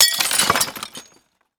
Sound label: Small Glass Pane Shatter